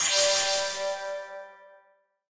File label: Butterfly Frenzy Pick Low; butterfly staxx 2